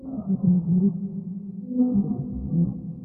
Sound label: Ice Whale Idle; Barotraumatic